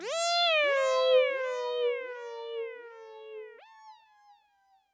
Pad MEOW; M8; Electronisounds; Synth Prophet One Shots

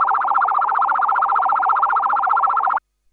SCanner, sci-fi

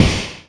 Wah Kick; Sonic Foundry (Sony Creative Software); Esoterik Beatz; Wah Kit

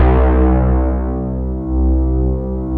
BASS, MEMORYMOOG; Rubber Chicken Software Sample Bank; OLD SYNTHS

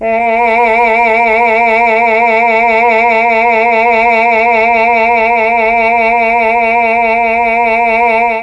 A#2 CHAMB MA, Mellotron, MALE VC; Mike Pinder - Mellotron Archive CD; CHMB MALE VC

